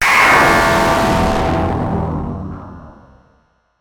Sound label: Impact Static Blast; bottlerocket